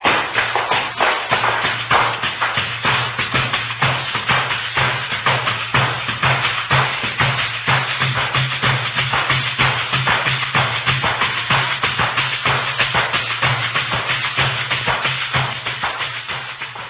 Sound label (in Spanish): abe, Idiófono, de golpe, directo. De percusión de vasos, campanas